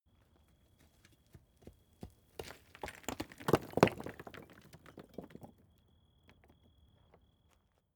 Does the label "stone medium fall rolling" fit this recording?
Yes